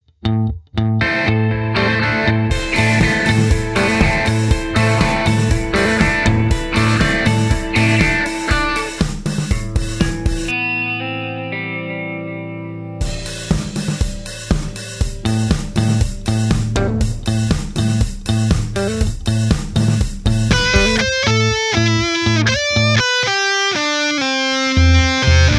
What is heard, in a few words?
MUSIC SAMPLES Rock ALternativ